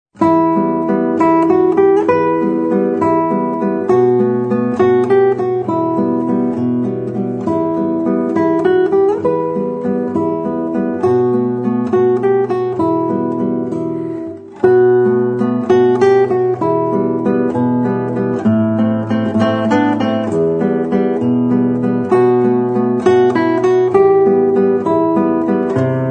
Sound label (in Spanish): vals; bartolome calatayud